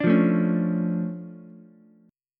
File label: D Minor Major 7th, D, Ukulele; DmM7 strummed; JGuitar